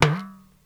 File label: ETHNIC DRUMS; Crypton - DrumBase5000